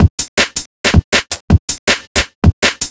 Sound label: Hardres Beat, Drum N Bass; Sonic Foundry (Sony Creative Software); Knutrix Drum & Bass